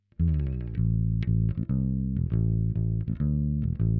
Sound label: bass; musdb18